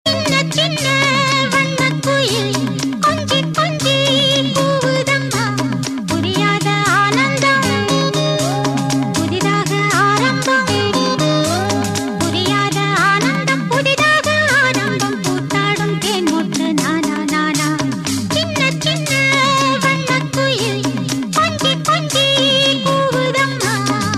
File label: tamil bgm; iyarkai; classical